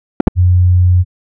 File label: iPhone 16, iPhone Boot Chimes Haptic